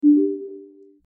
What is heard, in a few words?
start